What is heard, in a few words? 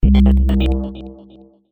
Stingers; The Dwarf Run; EV Hess Speaks